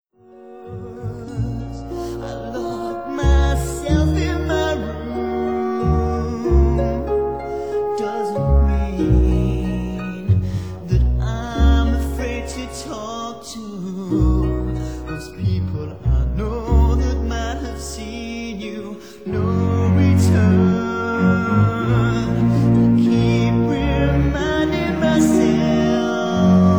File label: Orchestral Mix